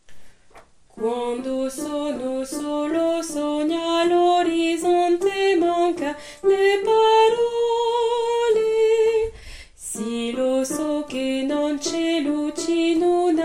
Con te soprane couplet

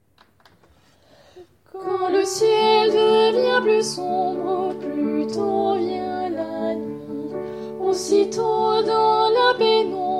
Noel Anglais TUTTI

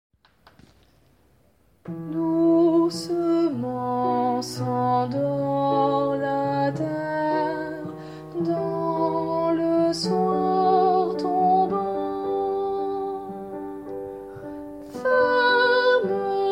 Voix; Berceuse cosaque

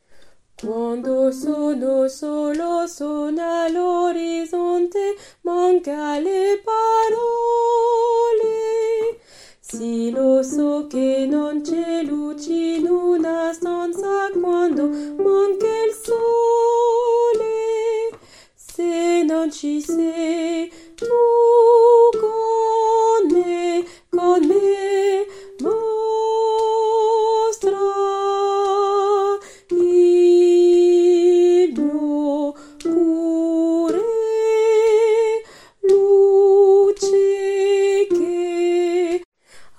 Con te, tenor couplet

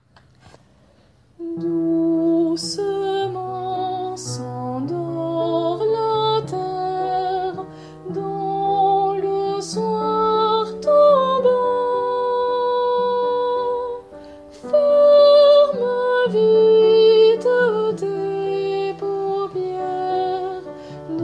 Voix; Berceuse cosaque

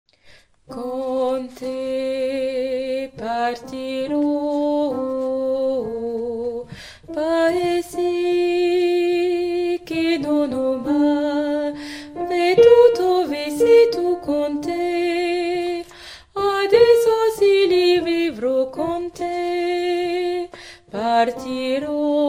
Con te basse refrain

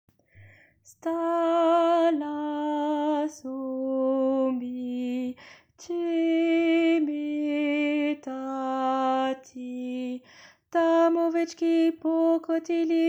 Alti (mi mineur); schedryck

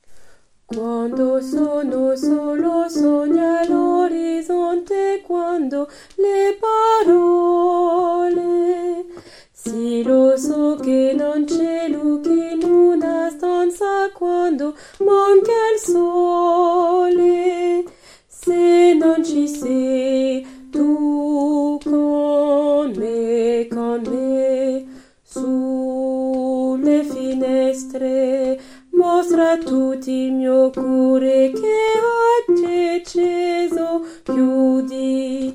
Con te alto couplet

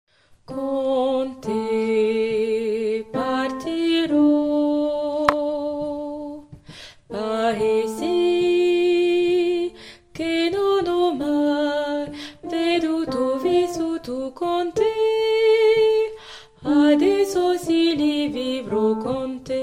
Con te alto refrain

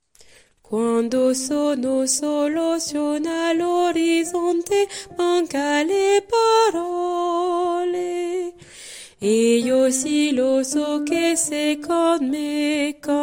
Con te alto couplet

